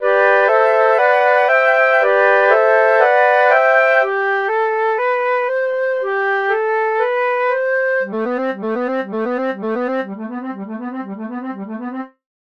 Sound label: Flute